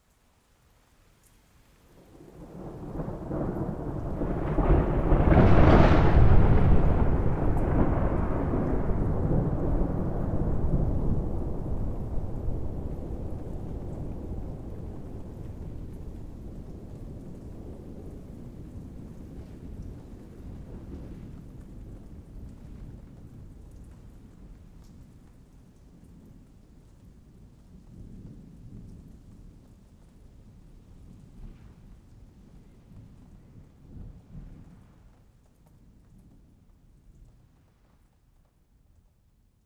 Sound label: Thunder Clap And Rumble